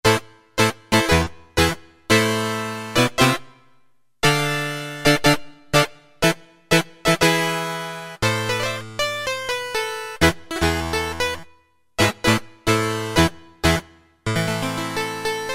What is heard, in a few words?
Harpsi, Roland D-110; Factory Patches